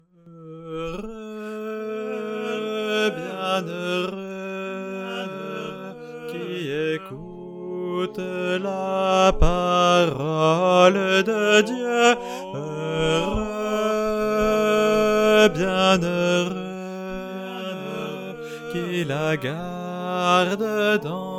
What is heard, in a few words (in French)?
sopranes